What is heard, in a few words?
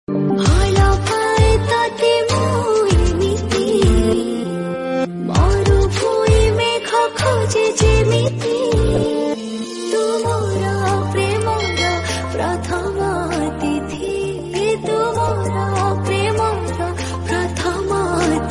Odia Ringtone